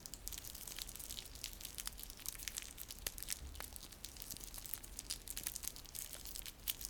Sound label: Campfire